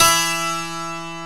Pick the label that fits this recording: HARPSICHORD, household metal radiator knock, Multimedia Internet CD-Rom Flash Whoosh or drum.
HARPSICHORD